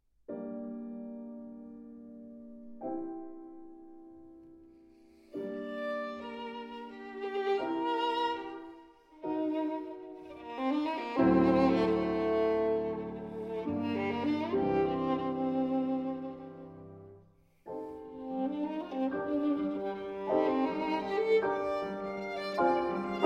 Sonata For Violin and Piano in G Minor, Allegro Vivo